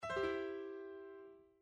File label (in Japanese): アルペジオ 上から下